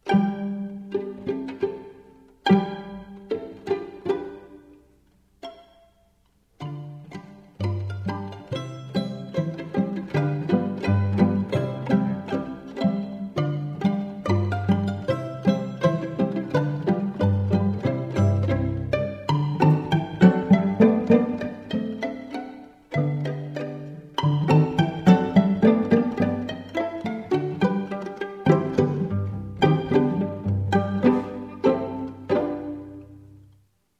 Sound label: Pizzicato Polka, string quartet